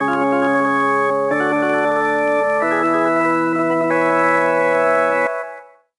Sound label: Organ